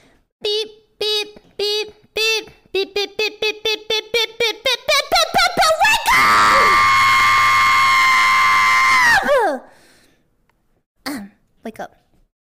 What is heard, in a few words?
alarm clock loud